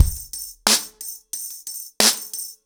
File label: 90BPM, ACOUSTIC DRUMS